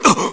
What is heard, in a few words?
Dizzy Hurt; Byrne; zelda